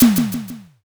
pistol

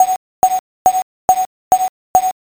railroad

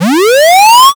charge

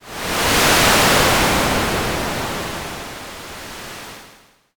wind

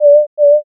owl

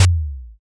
taiko

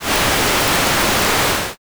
fire

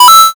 coin

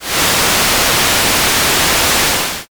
rain

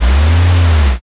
servo

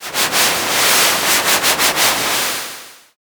river

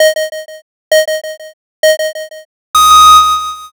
countdown

